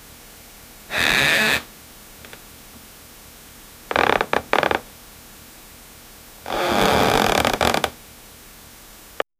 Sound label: bed creaking